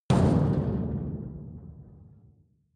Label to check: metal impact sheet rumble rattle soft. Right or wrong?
Right